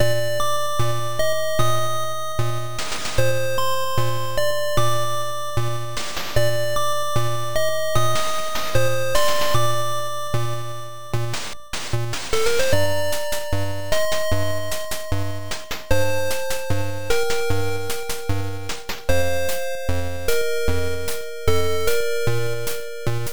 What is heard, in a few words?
A Victorious Bell is Ringing; MSX; herzog